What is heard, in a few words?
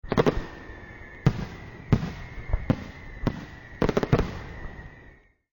Firework